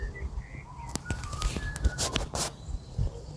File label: bird